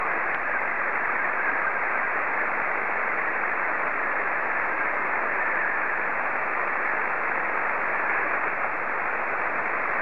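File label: Scottie 2, slow-scan television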